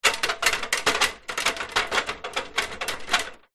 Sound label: industrial step ladder metal rattle movement